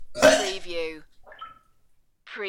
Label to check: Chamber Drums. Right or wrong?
Wrong